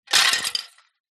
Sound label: foley plastic thin broken shards drop; zapsplat